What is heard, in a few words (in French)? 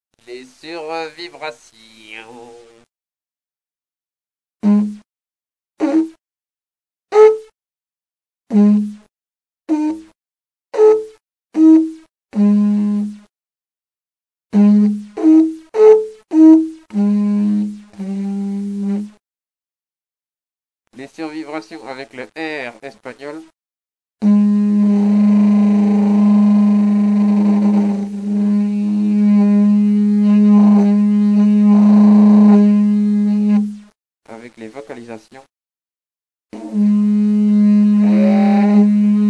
survibration hoot